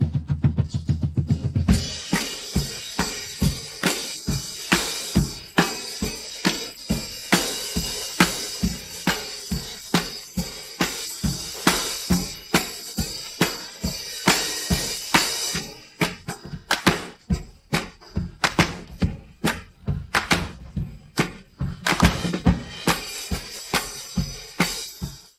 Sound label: Drums